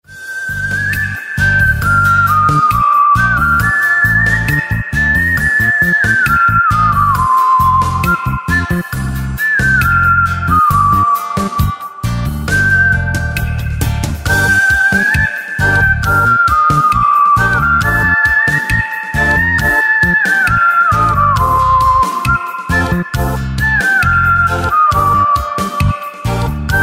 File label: iranian whistle